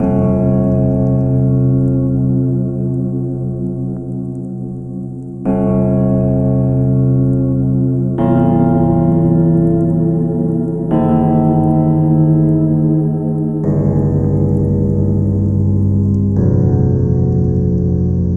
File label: deep Bell; 2kki